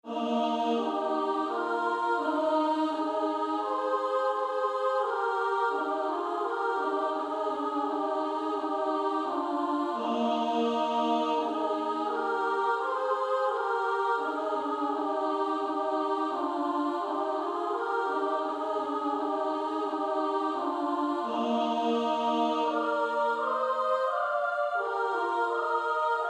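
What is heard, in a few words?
Female Choir soprano alto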